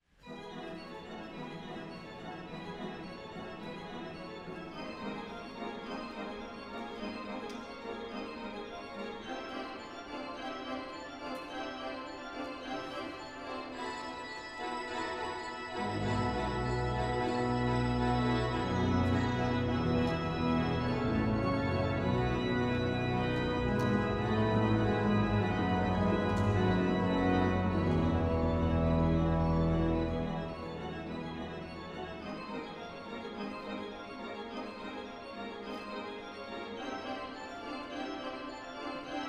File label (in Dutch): orgelsolo; Dankt dankt nu allen God; Mannenkoor concert in de Bovenkerk te Kampen